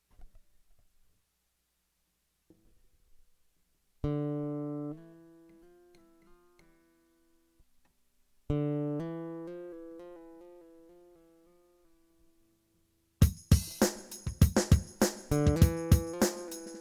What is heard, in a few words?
Instrumental